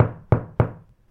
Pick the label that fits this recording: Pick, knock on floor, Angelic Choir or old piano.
knock on floor